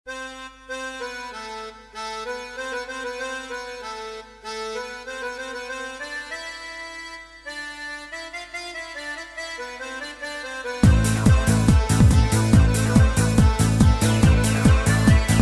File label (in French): CHANSON d ambiance